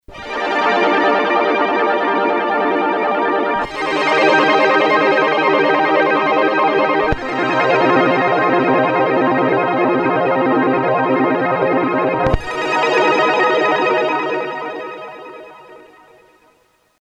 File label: korg m1r synth